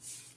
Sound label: wool; Pokemon-Off-White-unity